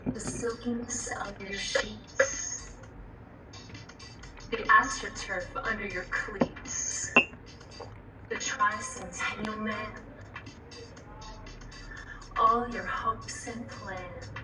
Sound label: vocals (via FaceTime); PledgeMusic